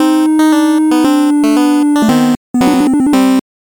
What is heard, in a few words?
gameover